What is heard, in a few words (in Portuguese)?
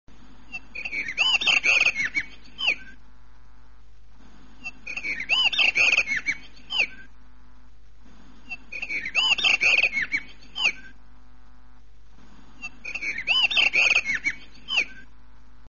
Melro azul; Ecossistema; montanha; aves